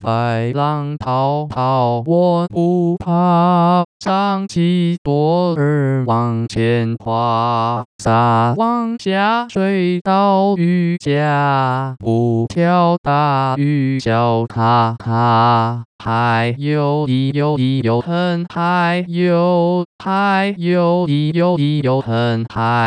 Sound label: Fishing Song, male